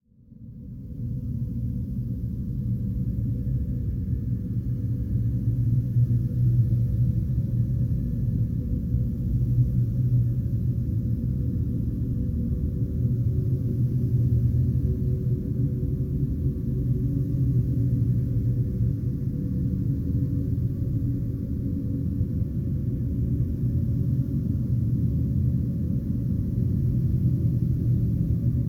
Dread Ambience

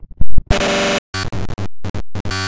Glitchy before

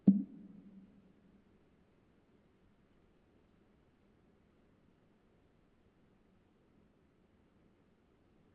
Footstep Neon

